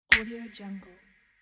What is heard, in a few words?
snap; sfxroom